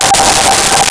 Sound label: Choir Oohs G5; Nintendo; July 2020 Gigaleak